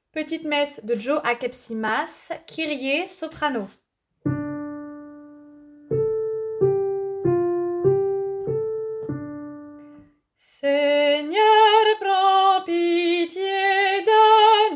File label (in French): Petite messe kyrie soprano; Akepsimas